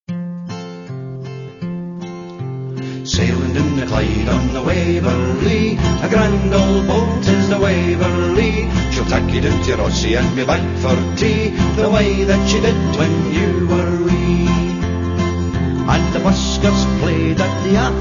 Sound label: polka